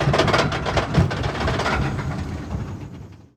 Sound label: climb end; Left 4 Dead 2